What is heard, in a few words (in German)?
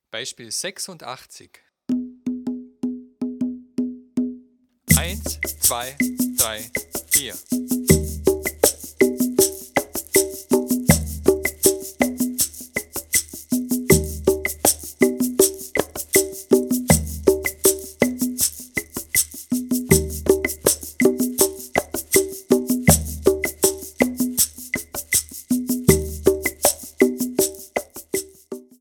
viererrhythmus